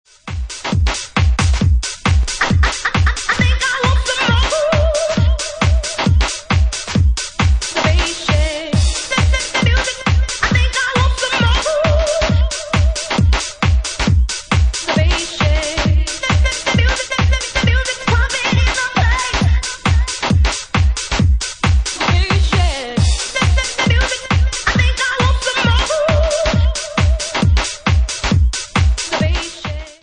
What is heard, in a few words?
Bass Power